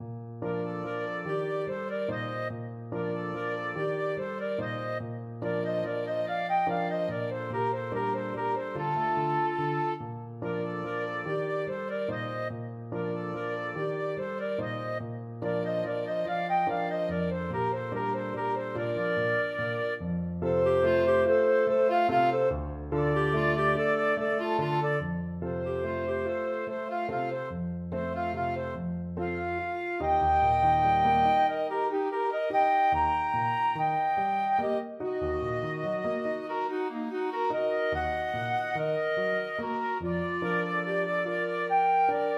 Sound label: lakme, flute clarinet duet